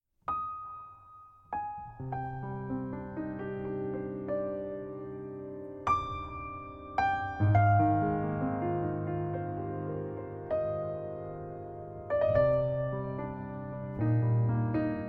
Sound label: naked piano